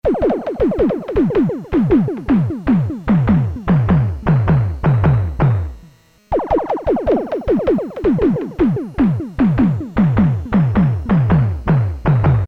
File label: synth. drums, Casio SK-1; Factory sound